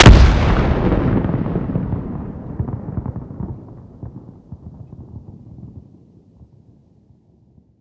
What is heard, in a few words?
cache explode far distant; emplacement